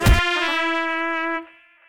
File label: Mariachi Trumpet slam attack; WrestleQuest